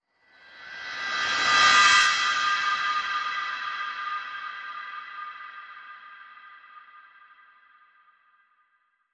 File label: Light Metal Whoosh